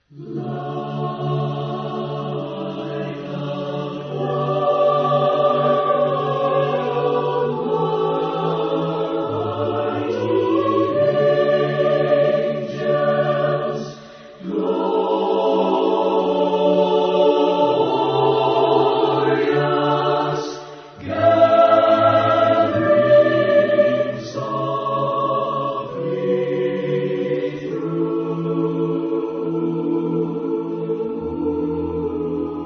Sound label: Like A Choir of Angels